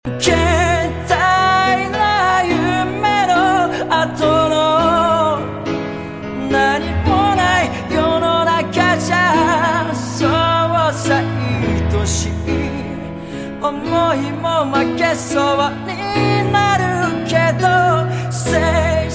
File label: Butterfly Piano